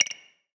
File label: Ui Items Button; portal quest android